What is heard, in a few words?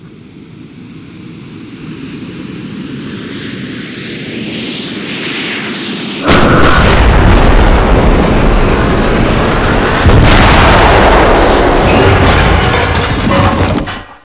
DROPSHIP, ambience